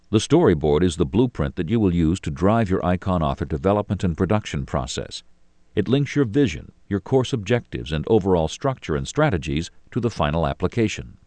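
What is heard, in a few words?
phase, techno bass drum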